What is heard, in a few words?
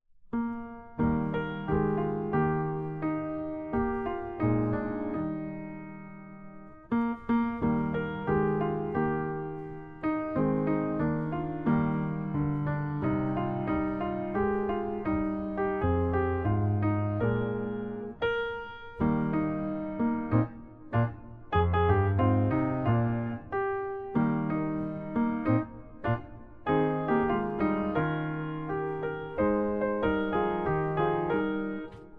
piano